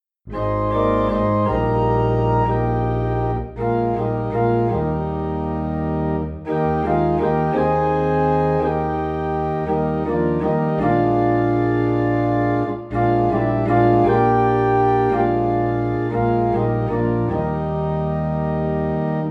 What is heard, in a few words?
Organ in E Flat; Have Thine Own Way Lord